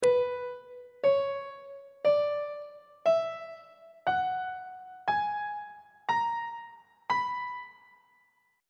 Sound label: b melodic minor scale note positions on treble clef